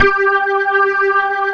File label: big organ